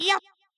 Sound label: jump; ebusimaru